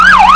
yelp, danish siren; vcmod; els

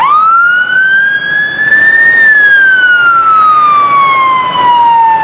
wail, danish siren; vcmod; els

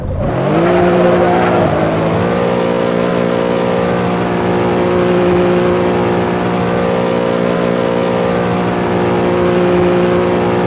rev, vehicles cars; lwcars

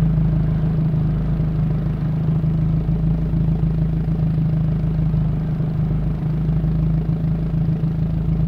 idle, vehicles cars; lwcars

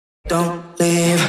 emote harmony music intro; actmod; fortnite

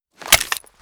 mag out, weapons; cw fiveseven

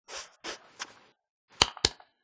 emote pouty clap intro; actmod; fortnite

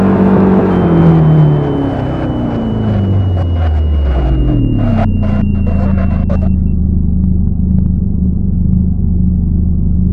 slowdown, vehicles cars; lwcars

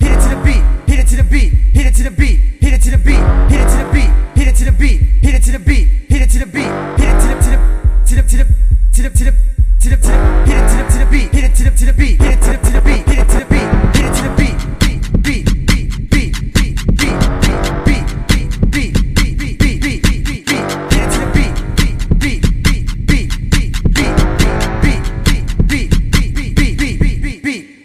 emote metronom loop; actmod; fortnite

